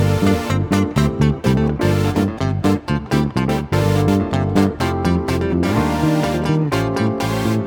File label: Backing, 125bpm Dm, Nu Disco; Zero-G; Construction Kits; Speedy